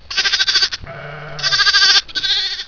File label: baa lamb